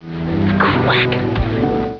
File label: quack short; Xena